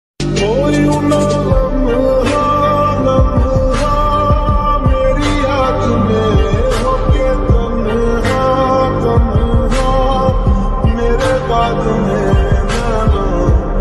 slowed reverb lofi sad lofi slow motion slowed and reverb